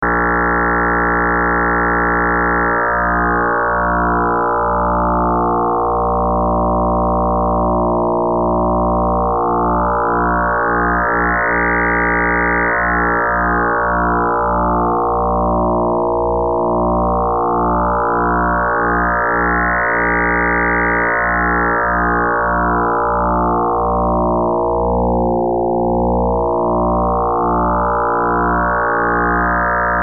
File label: fm patch